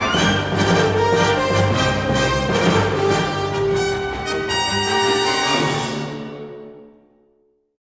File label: battle win, music